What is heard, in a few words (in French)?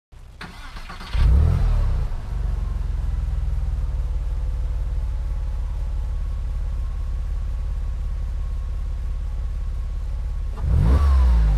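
996 acceleration, Porsche; Démarrage / départ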